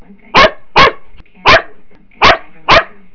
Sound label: sash bark